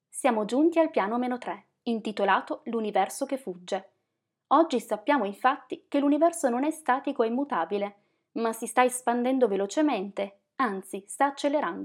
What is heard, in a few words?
Piano